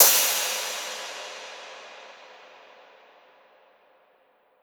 Cymbal Interstellar, 909; 909 Cymbals; Slate; Vintage Drum Machines Volume 3 909 Edition

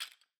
rattles Single Hit Wooden Chill; Slate; SD SEISMIC Drum Sample Pack

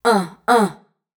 Uh Uh wet, adlib; Slate Digital Omnivox Vocal Sample Pack; Adlibs

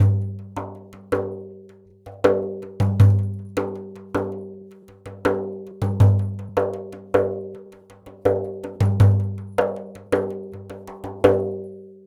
daf loop 80; perc construction loops; SEISMIC Drum Sample Pack; Slate

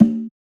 perc toy bongo, Drum One Shots; SD MIDNIGHT Sample Pack; Slate

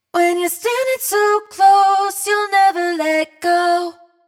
hook Close 128 Fm wet, Vocal; Slate Digital Omnivox Vocal Sample Pack

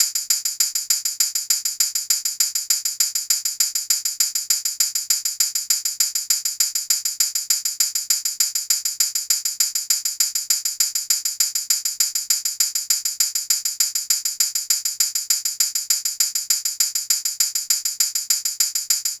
hat 100, Drum Loops, Hat Loops; Countach; Slate; MIDNIGHT Sample Pack

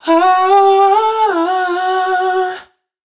riff smooth run Em wet, vocal; Slate Digital Omnivox Vocal Sample Pack

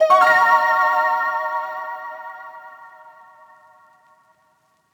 pluck trickle D#m; Melodies; One Shots; Slate; AURORA Sample Pack

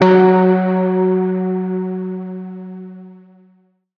tonal oneshot F#; Pop; Slate; PASADENA Pop Sample Pack; Tonal One Shots

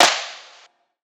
snare splasher; one shots; SEISMIC Drum Sample Pack; Slate

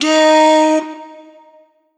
Omnivox oneshot Eb, Vocal; One Shots; Slate Digital Omnivox Vocal Sample Pack

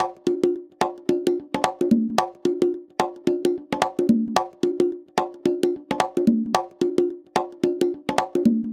latin conga loop, 110; perc construction loops; SEISMIC Drum Sample Pack; Slate

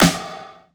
snare oneshot, Pop Sample Pack; Drums; SD PASADENA Pop Sample Pack; Slate

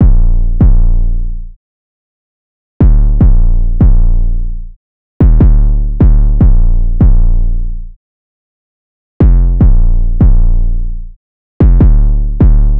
808 loop 150 Bb; Slate Digital BASSHOLE Sample Pack; 808 Loops

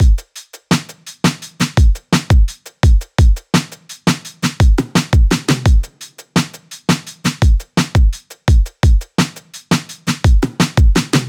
drum loop, 85, Pop, Full Drum Loops; Drums; wabash; Slate; SD PASADENA Pop Sample Pack

